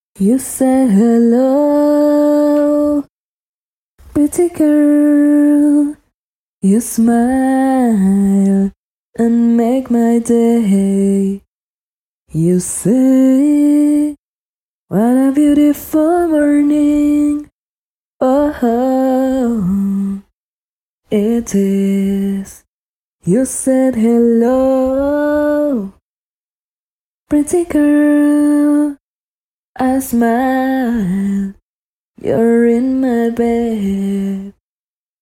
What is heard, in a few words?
indie 83 Cm, Acapellas; Hello Pretty Girl